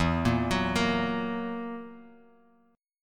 E Suspended 2nd Flat 5th E down Bass Standard; arpeggiated; JGuitar

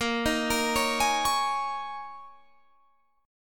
G sharp Suspended 2nd Suspended 4th, guitar chord; 18,18,20,18,21,21

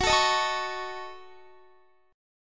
Gb Minor 6th, Guitar; Gbm6; strummed; x,21,19,20,21,20

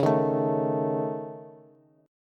C sharp Suspended 2nd Sharp 5th C sharp Bass; C#sus2#5; strummed; JGuitar

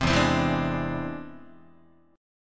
E Augmented 7th E down Guitar; E+7 strummed; JGuitar

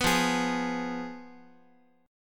F Diminished Guitar; Fdim strummed; JGuitar

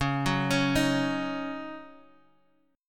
D sharp Minor 7th

